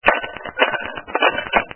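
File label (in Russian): лед накладывают в стакан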